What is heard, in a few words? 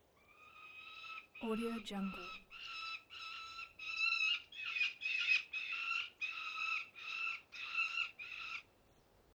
peregrine falcon overhead